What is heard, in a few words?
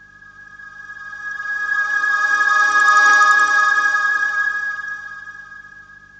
Trance Sweeps; Vengeance Samples; VEC2 FX